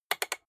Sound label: rotary control short quick turn hard